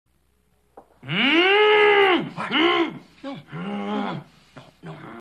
mmmm and anger

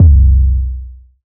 long bass drum sample c key

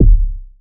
small reverb bass drum single shot c sharp key; kick

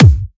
rich high end kick drum single shot g key

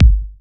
good bass drum g key; kick drum samples

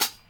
dry pedal hi hat single hit g key

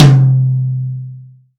acoustic tom one shot c key

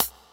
sizzle hip hop hi hat sound f sharp

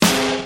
acoustic snare sample c key

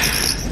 single bat squeak

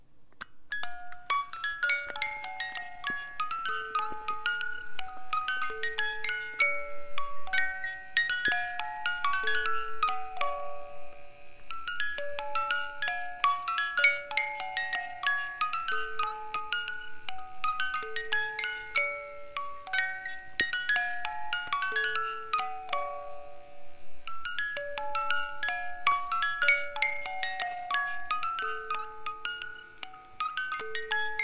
mechanical music box

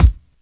raw bass drum sound a sharp key; Mono; kick drum samples

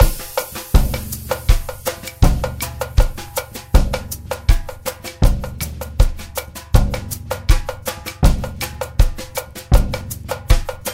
80 bpm 2000s drum loop sample A sharp key

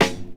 studio snare drum sound g sharp key; snare drum samples

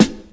natural snare drum A key

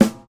dope snare sample g sharp; snare drum one shots

